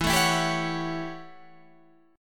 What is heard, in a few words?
A 13th E, guitar chord